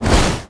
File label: sprint